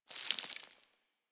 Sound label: Settlement Sand Fine Debris; Warframe; Grineer Settlement